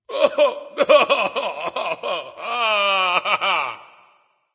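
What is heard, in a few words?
nonverbal boohoo; smite; voicepack; ullr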